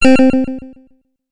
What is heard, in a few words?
end point reached, message tones